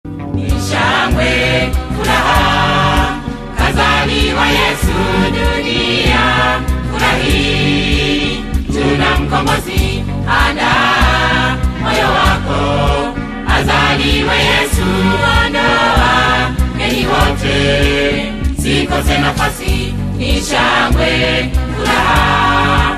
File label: Choir; celebration gospel song